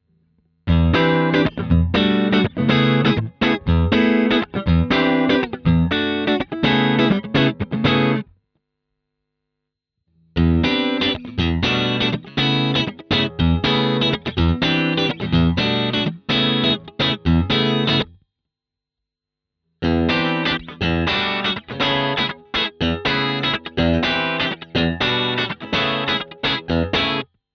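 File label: Chapman Strumming all positions, guitar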